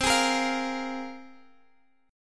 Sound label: C 7th Suspended 4th Sharp 5th, C